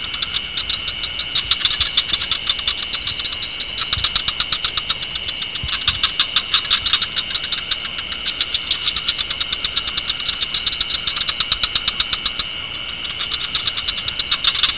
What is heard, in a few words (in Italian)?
suonerie musica ritmica